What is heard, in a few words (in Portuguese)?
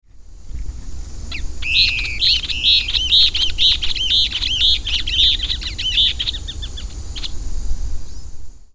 Elaenia flavogaster Guaracava de barriga amarela